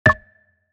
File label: Educational kids game menu ui confirm